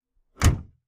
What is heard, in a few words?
Ford LTD hood and trunk open and close. Vehicles, Sedan Sedan, Car Open, Close